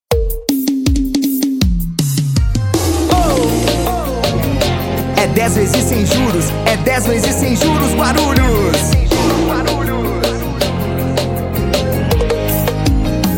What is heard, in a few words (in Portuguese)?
Jingle Parodia Casa e Construcao; Jotace